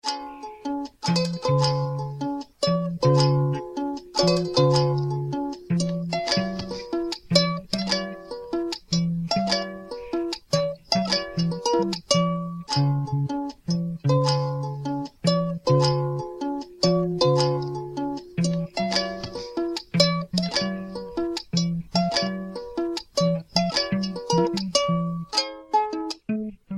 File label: messing around with my ukulele